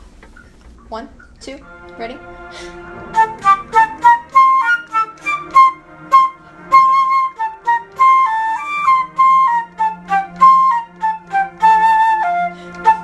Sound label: flute